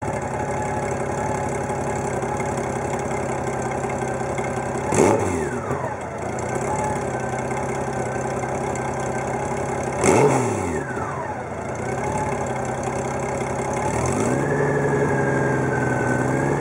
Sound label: Flat six